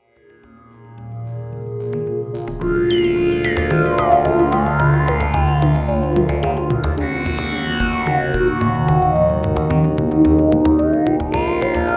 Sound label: Crumar Performer